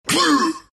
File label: pain, ru; dota2